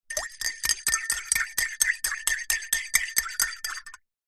household teaspoon stir tea or coffee in ceramic cup mug fast